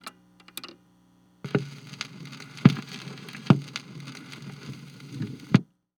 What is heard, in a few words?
Technology Electronic Machine Wood Record Player Record Starting